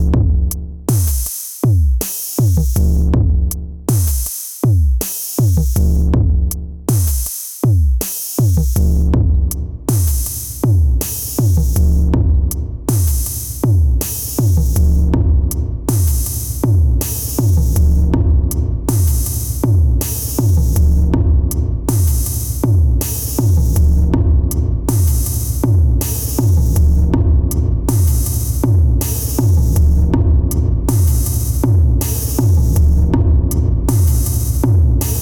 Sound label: Delay Hardware Reverb Drums; BIAS Pedal